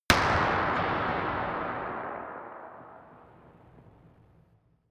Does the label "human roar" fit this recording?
No